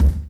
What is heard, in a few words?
broken, Kicks Medium